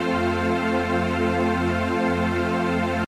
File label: fa majour, playback